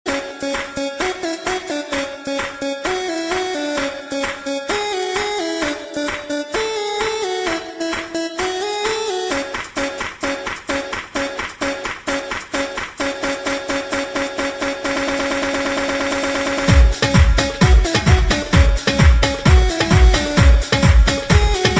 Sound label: rumba mix; reggae